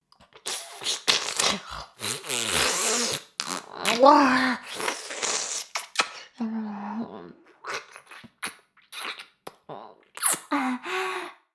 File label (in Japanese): フェラ音声; 牧場戦腺; 少尉